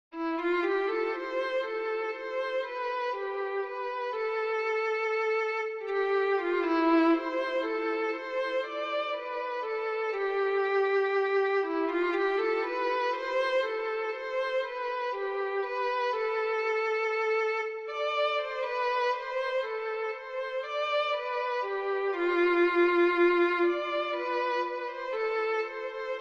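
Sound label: violin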